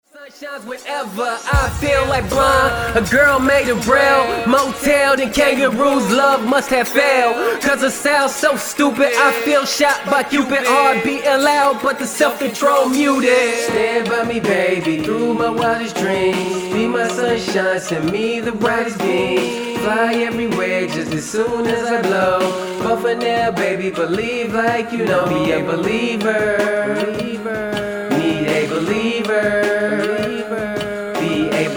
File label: new hip hop songs